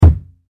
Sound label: Impact Wall Hit Hollow; Thuds; Objects